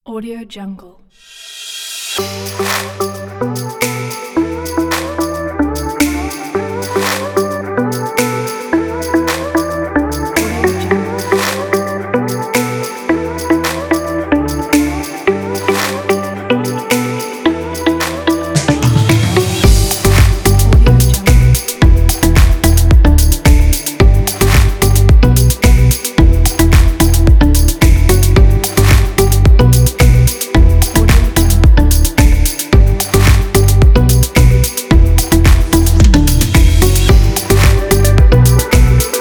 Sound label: tropical house kit